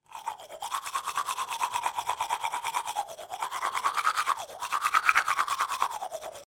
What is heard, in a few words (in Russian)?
zvuk zubnoj shhetki